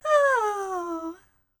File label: aauuu